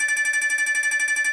Big Win Tally countup Loop; Wicked Tales Dark Red

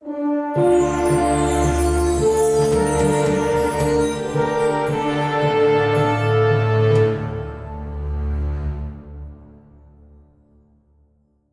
Bonus Loop Outro; Mirror Magic; genesis

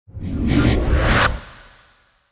Exit Negative; medallion; Fantasma

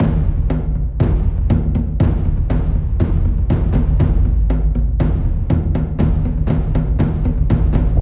Bonus Loop Intro; Wu Xing; genesis

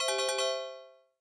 win shake; vampire

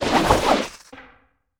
staff spin; adelia The Fortune Wielder; Foxium

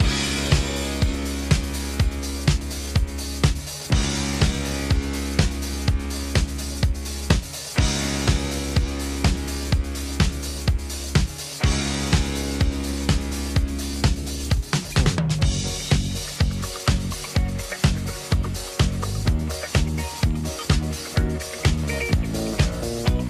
Base Music Loop

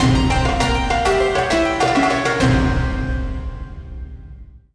Game Intro; Temple of Luxor

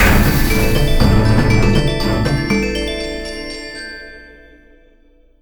Win; Jason's Quest

